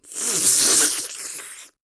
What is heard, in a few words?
Slurp; Ghirahim